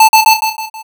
alarm

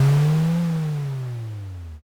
lion roar

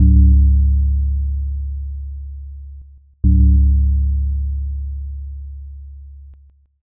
belltoll

